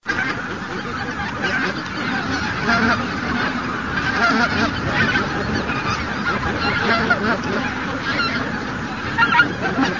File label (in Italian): OCA DARIO, birdsound